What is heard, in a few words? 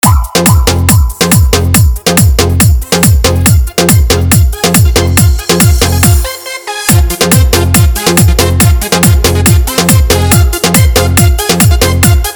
Trending Bhojpuri Dj Remix Song Hard Faadu Electro House Mixx